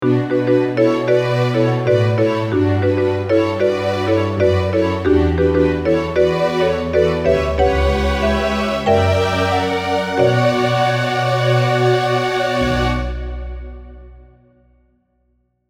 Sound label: Halion Strings n Marimba, layering digital keyboard sounds